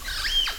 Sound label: diana vocalisations